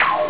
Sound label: boing